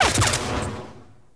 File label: projectile absorbed; impacts explos